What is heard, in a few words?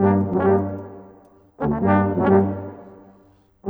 Brass, Orchestral, Rock Pop